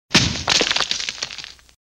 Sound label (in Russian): osypaetsja shtukaturka; Звуки строительных работ; снос зданий; Звуки строительных работ обычно связаны с разрушениями, такими как снос зданий